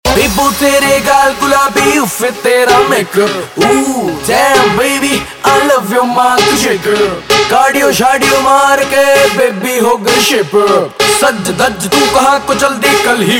RAP Ringtone, Punjabi

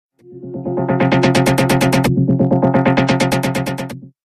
Tam Tam 3d Sms

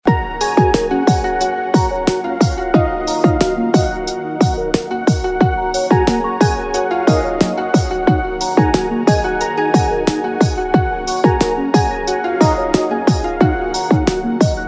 Instrumental Ringtone; Bollywood Hits (2014)

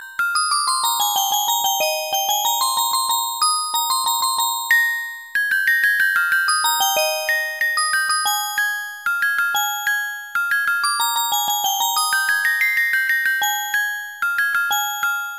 Musicbox Xmas

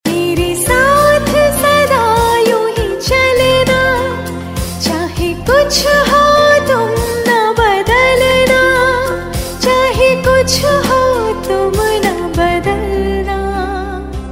Title Tone; TV Serial Tone; Sony

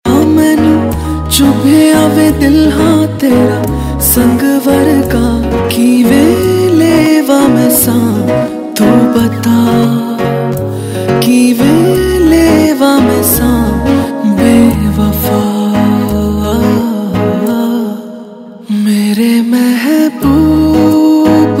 Reprised Punjabi Version Unplugged Ringtone

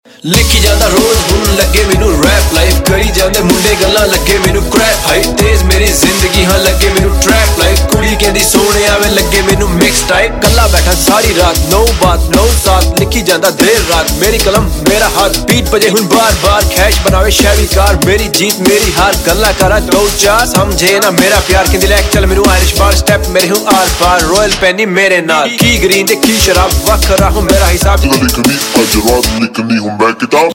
Rap, Desi Hip Hop Ringtone

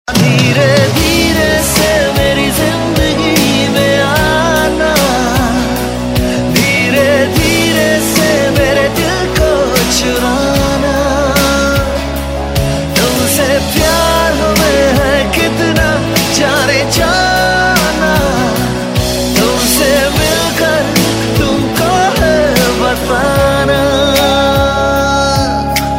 Male Version; Star Plus

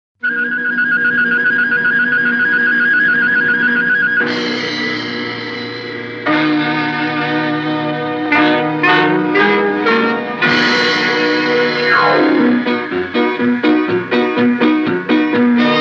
Instrumental Outro; House No. 44 (1958)

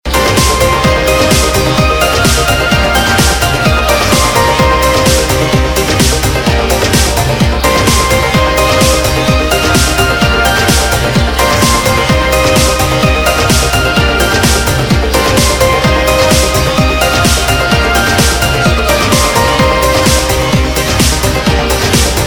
Instrumental Ringtone; Bollywood Hits (2014)

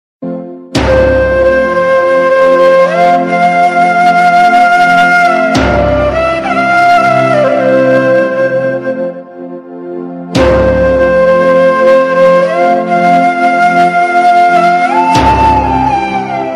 Instrumental Title Tune

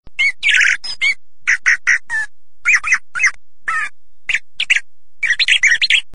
bird chirping; Pets And Animals Ringtones

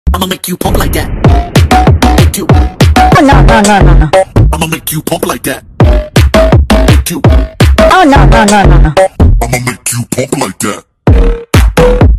English Punk Punk

